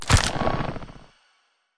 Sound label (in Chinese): 嘎枝激活声